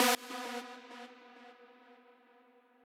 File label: lead